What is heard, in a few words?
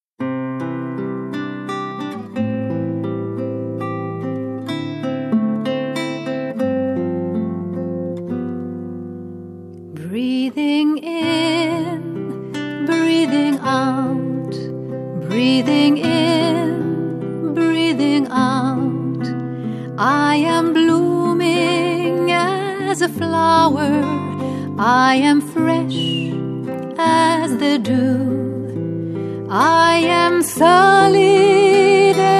breathing in - breathing out